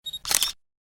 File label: traffi car; Traffipax